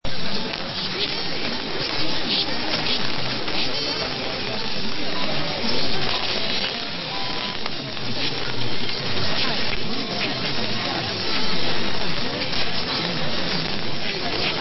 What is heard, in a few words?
750 Nacional Radio Argentina 2 + 1 time pips; LRA7